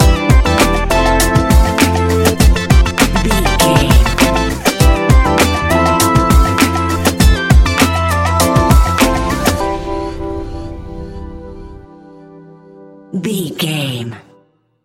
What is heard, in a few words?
A relaxed and chilling piece of music; A relaxed and chilling piece of music, great for light documentaries, background music for tv shows, lift